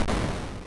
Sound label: Open; Major Havoc